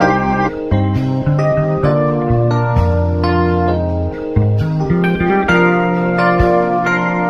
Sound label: keys, sad loops